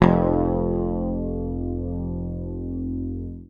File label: PICK, Bass Library